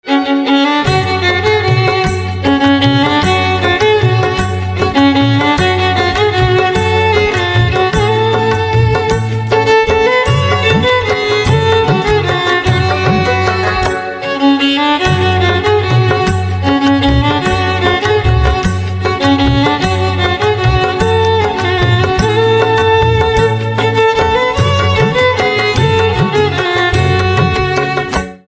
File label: Violin